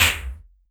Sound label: Elektron Monomachine, Drum Machines